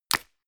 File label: mechanical switch wood small push; industrie-wirtschaft; mechanik